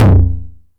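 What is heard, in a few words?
909 high tom tape saturation; Goldbaby Tape808 & Tape909; The Tape 909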